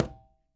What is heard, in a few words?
quinto; Sampleheads; NYC Percussionworks